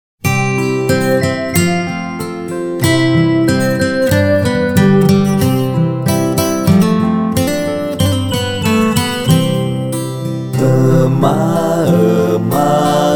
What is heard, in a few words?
Song, PT